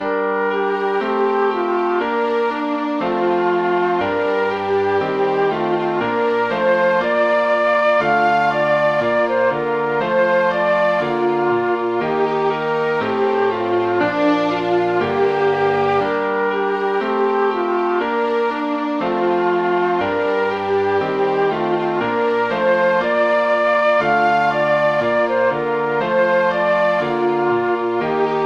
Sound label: birdsong